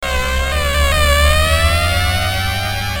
pitch fx loop

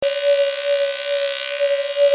eary atmos buzz loop, synths

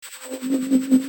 pan fx rhythm

